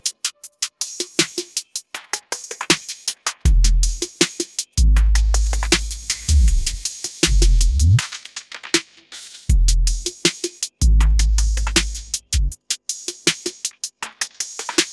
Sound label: ringtone hiphop